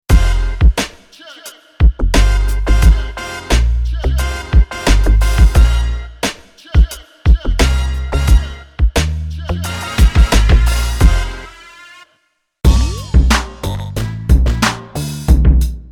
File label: Beats